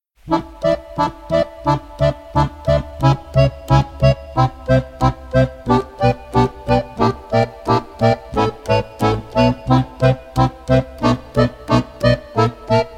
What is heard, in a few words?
Kaval Accordion